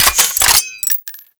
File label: Revolver Rifle Reload, Weapons; Warframe; Tenno; Mod Quest